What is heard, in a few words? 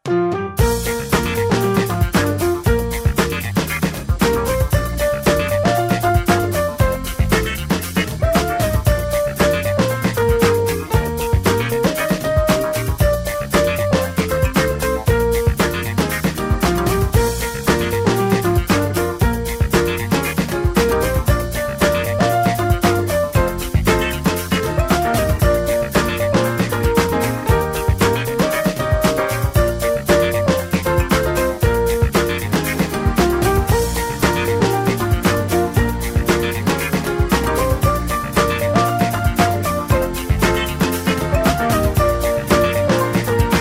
Oldtune; lemmings; psp